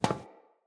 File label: Friendly NPC Step Default; Characters; Footsteps